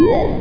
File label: jump